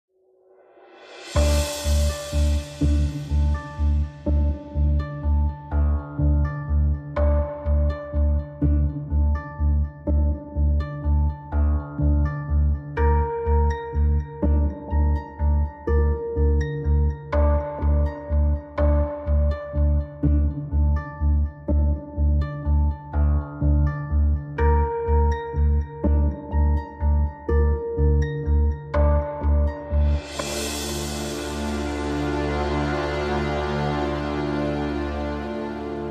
Bed

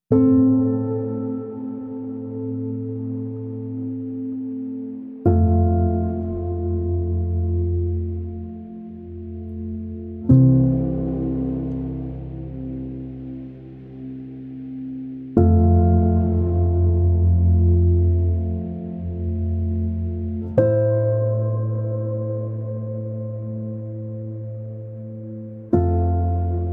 Only Piano

